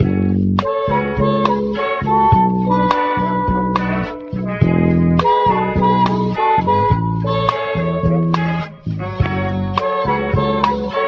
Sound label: horns, phonky loops